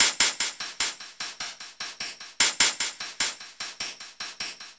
Chuff Echo, Synthetic Pulses

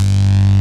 SYN BASS; Pro-Rec - Synthsonic; TRINITY

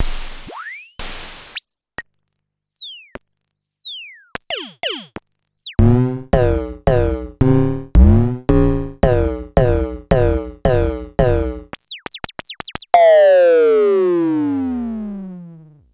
Klone Dual Percussion Synthesiser freq

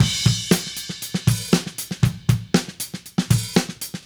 Drums Crash, 70s Analog Funk; Sonic Foundry (Sony Creative Software); What it Is!; Good Times

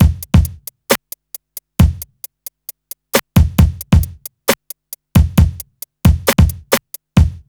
BEAT, Hip Hop R n B; USB Soundscan vol.19; AKAI

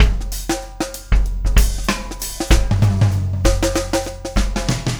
Fill, Loops; Sonic Foundry; Not Just Another Pretty Bass; AI Loops - Siggi Baldursson

